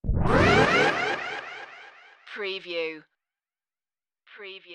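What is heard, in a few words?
Crazy Rush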